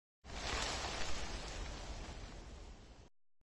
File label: shake tree